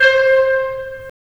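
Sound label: wind clarinet; Roland - LA Composer vol. 3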